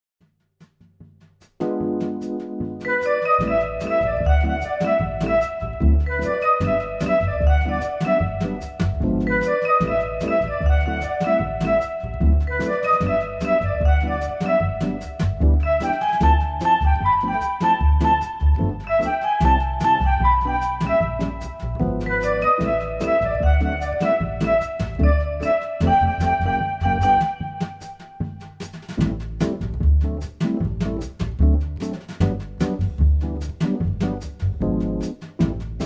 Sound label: world bossa build end